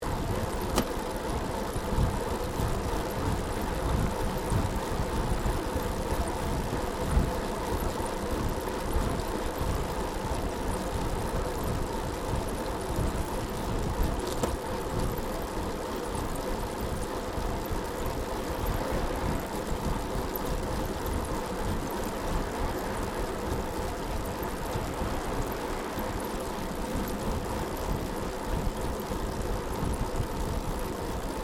Bicycle Riding Smooth Bitumen Stereo, 21 speed road bicycle; Giant Crx3; Bicycles